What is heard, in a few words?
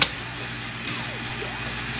invaders shot